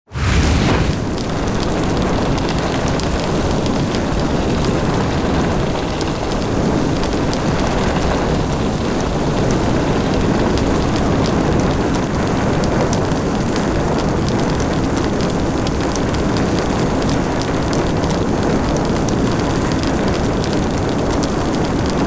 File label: dragon breathes fire